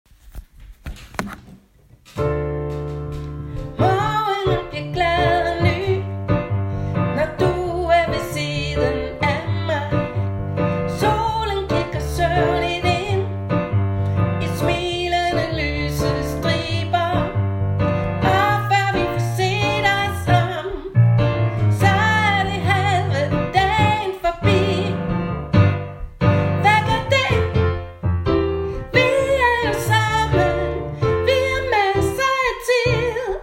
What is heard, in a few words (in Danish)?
sopran tenor; 1. sopran